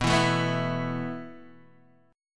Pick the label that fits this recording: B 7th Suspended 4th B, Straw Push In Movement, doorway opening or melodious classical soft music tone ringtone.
B 7th Suspended 4th B